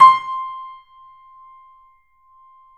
3D Pianos, Yamaha C7; E-MU Producer Series Vol. 5

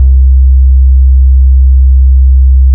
S950 Style, Basses; AMG - Prototype Drum & Bass

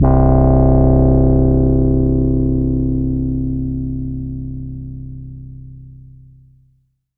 pad C2, Moog Minimoog; Pads

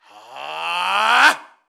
AAhhh, Vocals; Roland BK-5

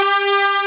sax E2; AKAI S-Series CD-ROM Sound Library VOL-2

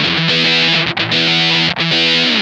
BIG, Funk Guitar; Zero G - Funk Guitar

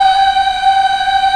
VOX African; Roland LCDP11 Africa VOL-1

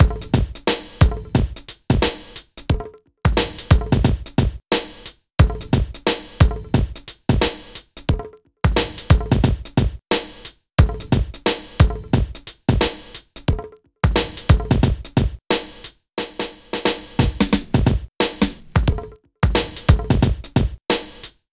Drums, Hip Hop

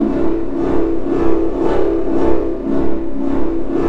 TUBE SPUN

